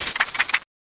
Powerup Dear Diary Trigger

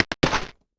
Powerup Boardgame Trigger

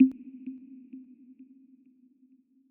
Beep Flicker

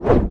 Woosh Out

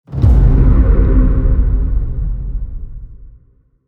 Sound Skeleton Impact

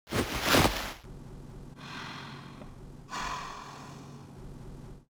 Sound Wc Poop

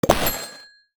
Tickets Retrieve

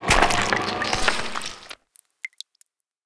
Trash Horror

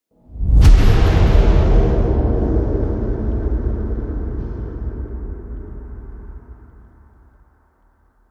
Impact

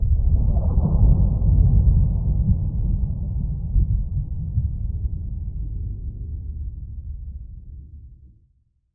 Title Impact

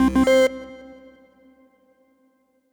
Slot Machine Spin Win

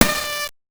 Sound Reward Box Confetti

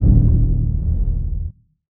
Powerup Void Trigger

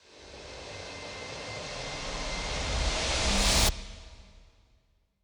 Sweep

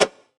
Sound Menu Quit

